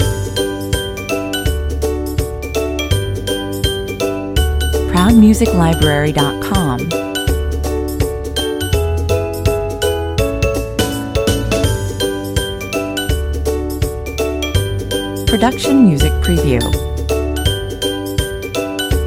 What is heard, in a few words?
happy explainer loop